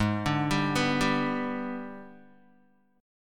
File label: Ab Minor Major 7th Flat 5th, down, Guitar; AbmM7b5; arpeggiated